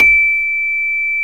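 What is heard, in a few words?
CELE HI, percussion; AKAI S-Series CD-ROM Sound Library VOL-8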